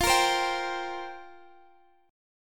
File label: F Suspended 2nd Suspended 4th, down Guitar Drop D